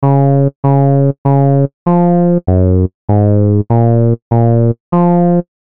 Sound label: USSR Lead